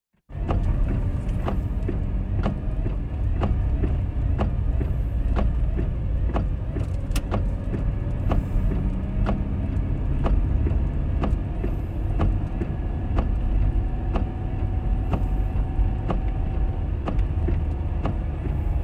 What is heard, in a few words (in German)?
autofahrt mit laufenden scheibenwischern; Auto-Zweiraeder